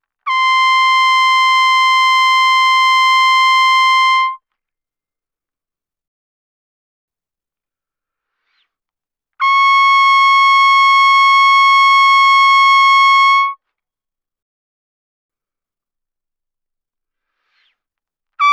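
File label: Trumpet no vibrato mf C6 D6; Brass; Bbtrumpet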